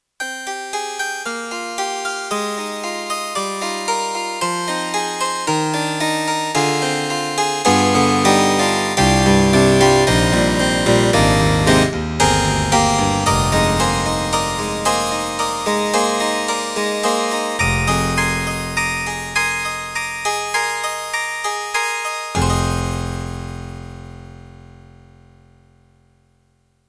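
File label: YAMAHA MU1000EX Harpsichord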